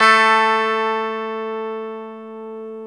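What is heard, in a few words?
guitar electric 12 string; Club-50 - Foundations; E.12 String; Ricky12Str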